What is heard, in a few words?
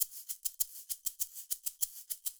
Maracas; Pro Tracks